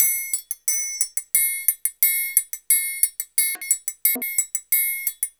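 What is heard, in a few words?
TRIAN, triangle, Modern Percussion Loops; Soundscan vol.56; AKAI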